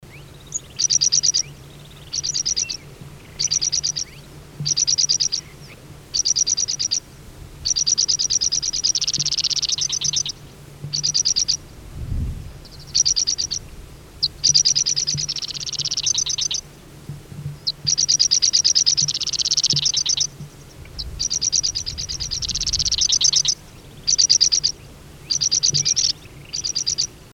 suara redtufted sunbird

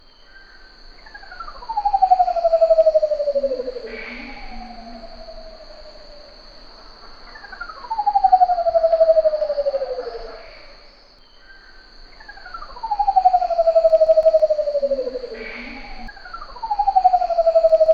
manucodia jambul

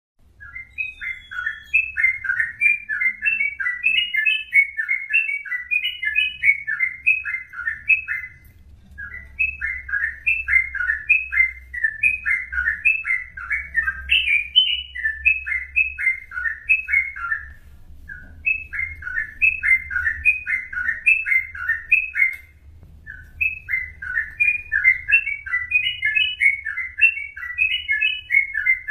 trucukan ropel

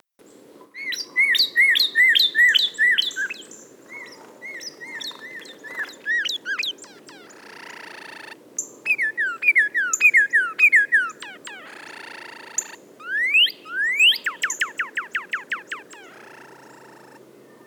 suara cardinal

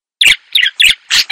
burung asing

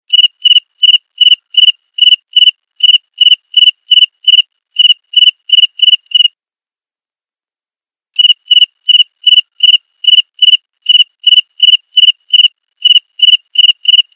jangkrik

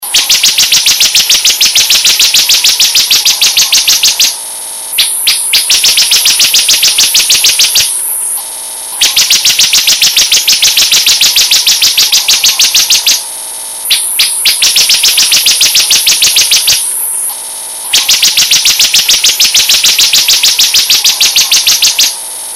ciblek masteran kenari

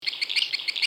varian lagu suara burung walet

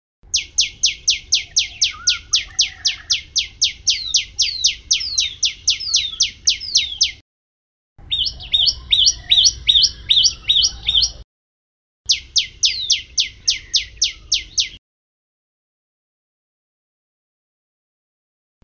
suara alarm call burung prenjak lumut

